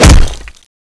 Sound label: speargun stone; weapons; cstrike